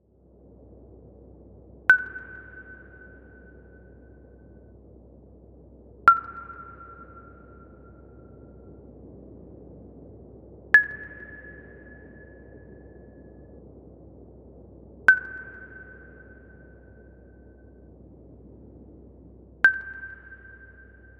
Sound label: natural cave atmosphere